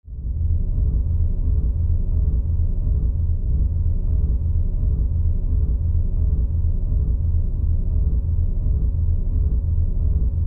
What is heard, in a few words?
empty room drone trailer song